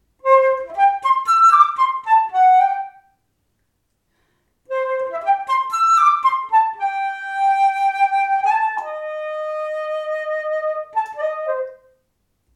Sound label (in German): floete